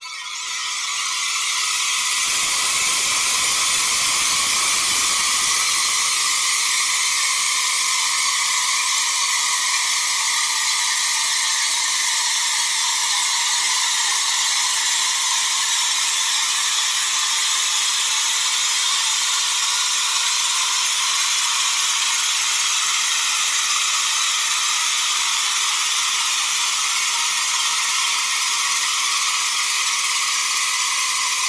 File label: dark ambience pad high screaming swarm; sonniss gdc